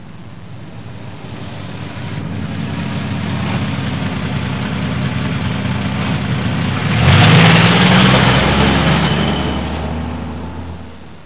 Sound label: trucks